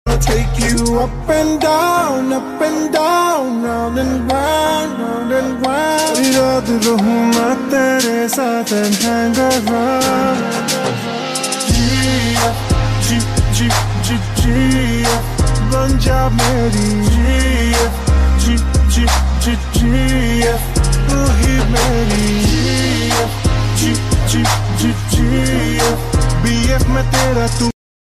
slowed reverb ringtone

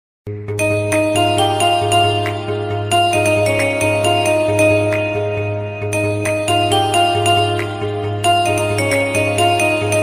ekvira aai instrumental; ekvira aai special ringtone 2021; ekvira aai trending ringtone

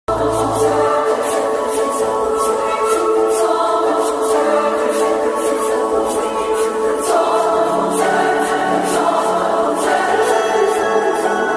radhe shyam best romantic bgm ringtone music; prabhas; pooja hegde

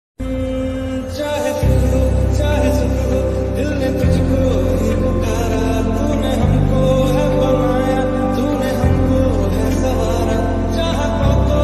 Punjabi ringtone Hindi song ringtone

